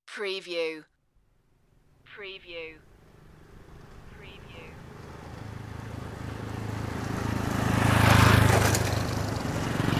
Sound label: rattly motorbikes passing